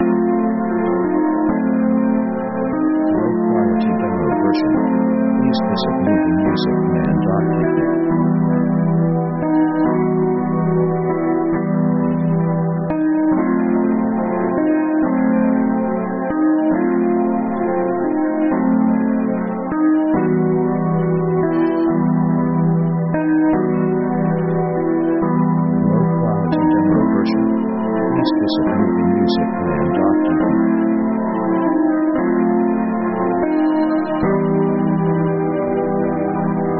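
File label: synth orchestra chord noir sketch; synth themes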